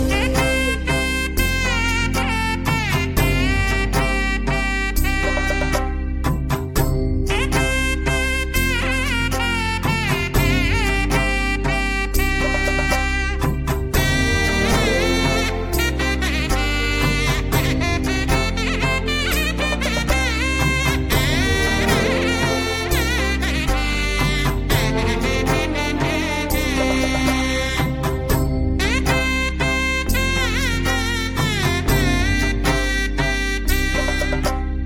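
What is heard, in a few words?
background music ringtone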